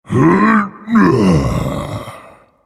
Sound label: Vocal Colossus Efforts Medium